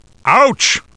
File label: ouch